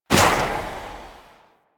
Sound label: Pistol Shot; Weapons; Warframe; Tenno; Priest Pistol